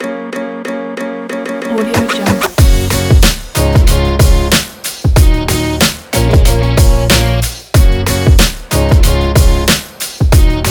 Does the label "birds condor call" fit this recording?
No